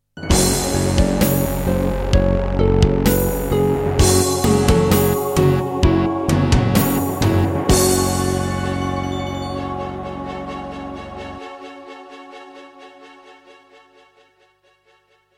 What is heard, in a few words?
Introduction, Roland SC-33; Doom (Sigil)